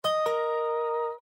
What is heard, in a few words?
Notify; Windows 7 Sounds; Delta